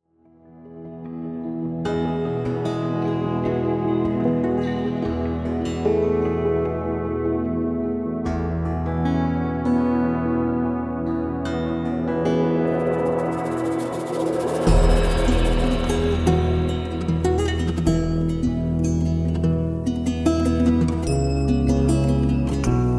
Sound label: Relaxing